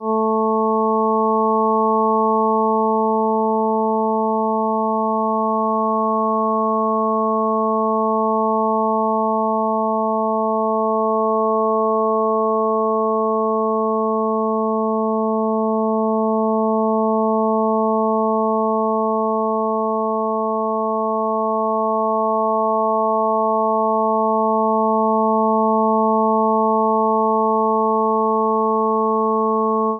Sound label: partials rand